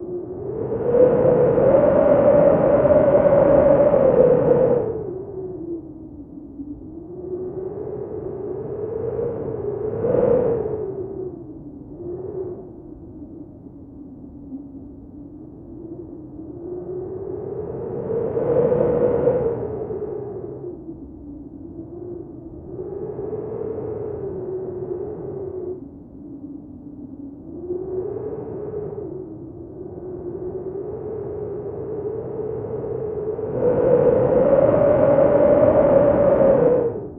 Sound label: howling wind